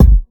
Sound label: short steel kick drum g sharp key; kick drum samples